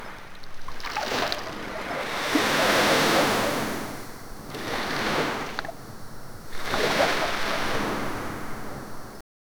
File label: ocean ambience night; 3d audio